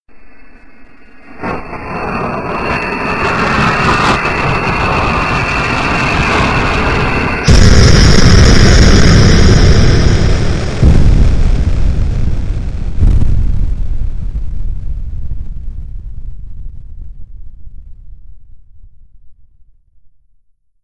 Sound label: jet crash; horror